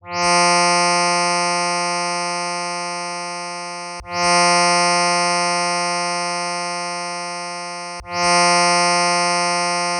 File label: sa 233 string, tanpura; tuner